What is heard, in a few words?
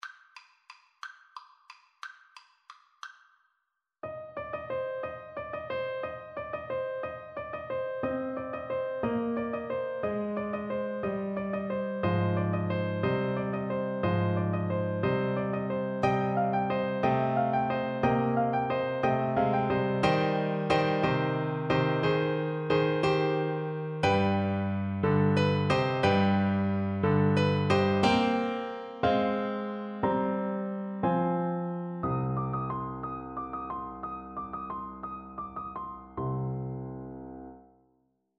carol of the bells, soprano saxophone